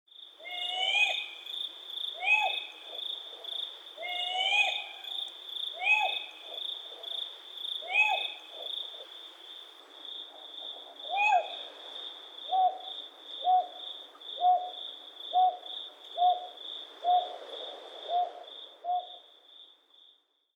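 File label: aobazuku, Northern Boobook; izu; koe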